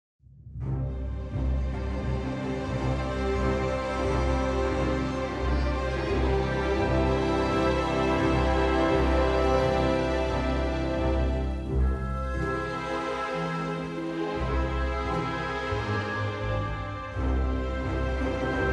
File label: Fanfare